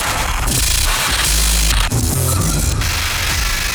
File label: Glitch; KSHMR